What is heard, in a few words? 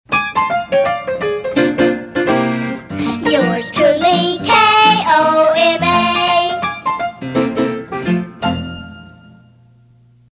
Rinky Tink Piano; PAMS; KOMA; Redos